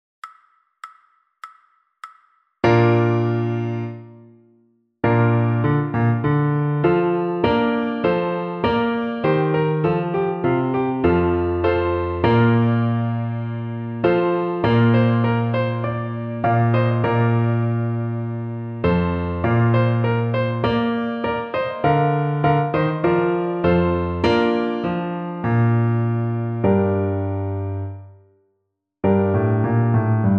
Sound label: piano slow; Trumpet March